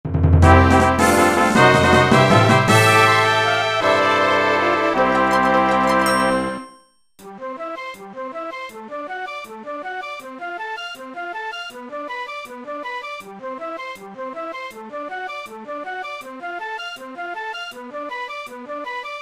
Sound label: Win Jingle